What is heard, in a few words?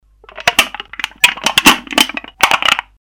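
jars sound; zvuk banok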